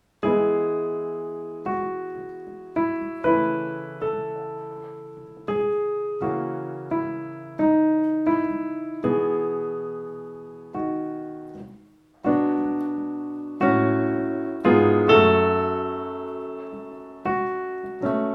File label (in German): rhythmus